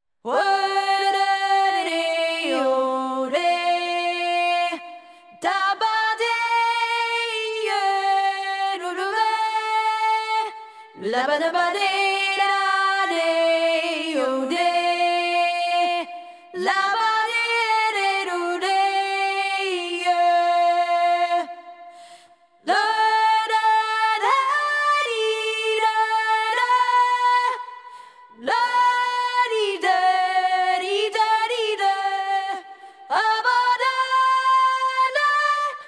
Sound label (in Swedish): SOPRAN; popvis koren